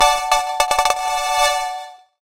kids game win synth cheer positive arcade casual bling; ding